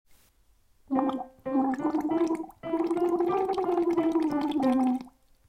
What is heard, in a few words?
staccato U; Arbol